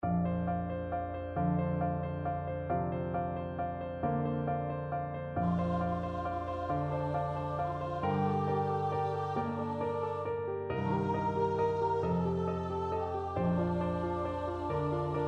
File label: evensong VOICE; lehmann